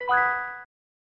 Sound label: Fanfare Chime; Taz-Mania 1993 SNES